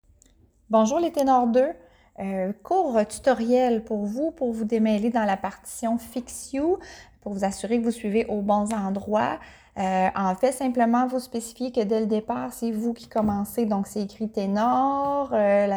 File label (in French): Tutoriel partition Tenor